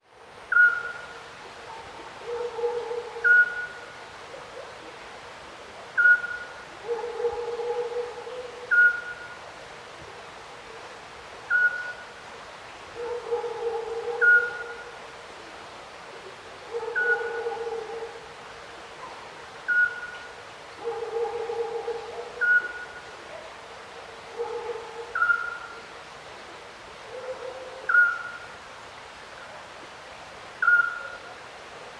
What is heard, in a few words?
little owl river night distant; sonniss gdc